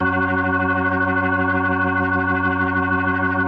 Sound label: F#2F, B3, Vintage Keyboards